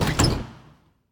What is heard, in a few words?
chest open